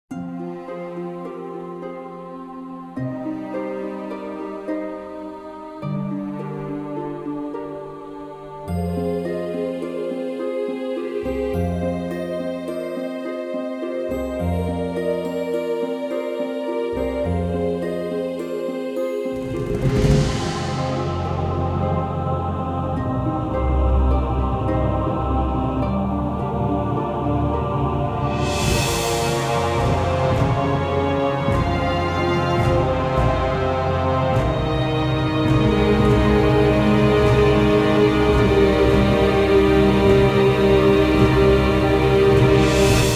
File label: Elves Amb; Disciples III Reincarnation